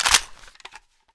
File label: m16a4 clip in, weapons; cso